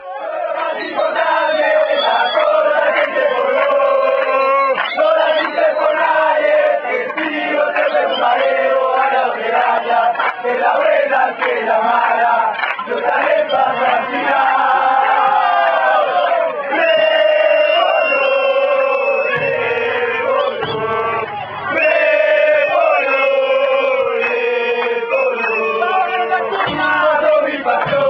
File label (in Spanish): repollo repollo, chants